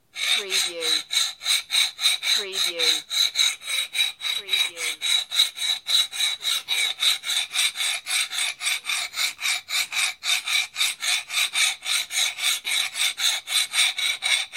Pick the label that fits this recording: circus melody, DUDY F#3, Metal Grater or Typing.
Metal Grater